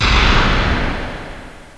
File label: BASH; THEXDER